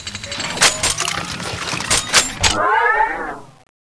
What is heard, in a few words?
cannon transform; weapons; z47 cannonex